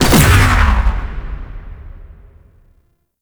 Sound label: Railjack Blackhole Ability Fire; Warframe